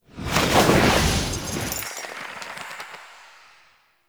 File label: Movement Whoosh; Enemy; Mendicant; Mnd Wazard